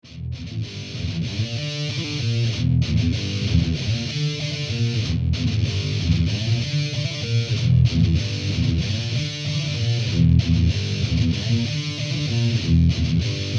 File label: Mark IIC Deep